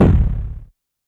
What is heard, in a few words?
Bass Drum Destroyed; Lost Tapes From Mars